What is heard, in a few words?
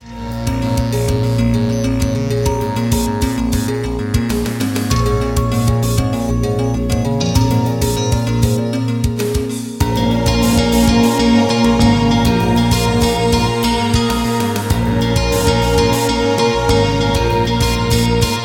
Nokia Nails